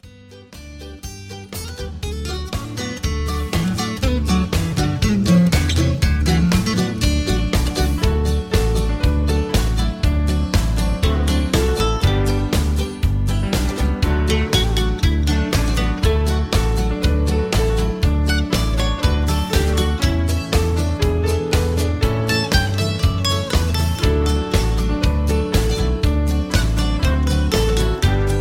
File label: Instrumental; Singing Calls